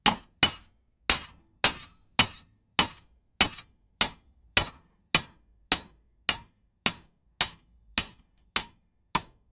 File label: blacksmithing with hammer